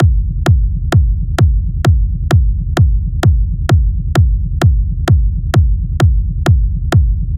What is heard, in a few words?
Techno Kit 130bpm Kick; EDM Drums; Drum Kits; Stems; Ghosthack; Drum Mega Bundle; Ultimate EDM Drums; Techno Kit 11